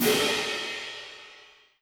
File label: crash